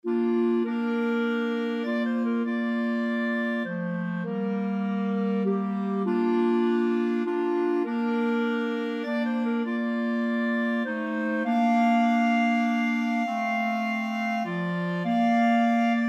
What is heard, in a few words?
clarinet trio